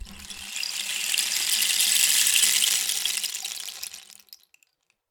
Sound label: Rain Stick, Percussion Samples